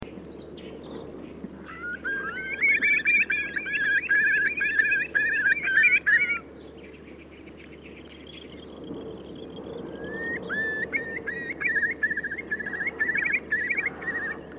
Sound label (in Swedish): Storspov